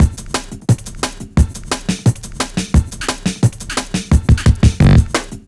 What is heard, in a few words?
175, Drum Loops; M8; Electronisounds